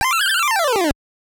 kill enemie; ducktales

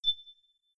menu; Metal Gear Solid 4; gamesoundopedia

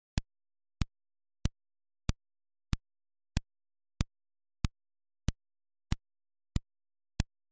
tile floor; Little Computer People; gamesoundopedia

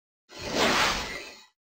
level start; Crash Bandicoot

